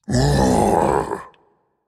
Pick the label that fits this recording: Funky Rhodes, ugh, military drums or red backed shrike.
ugh